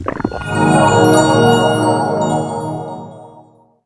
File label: heal